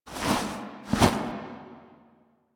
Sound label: Mask Pull Foley; Cinematics; Teshin; Warframe; The New War; Throne Room